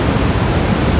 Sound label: radio noise; fgdata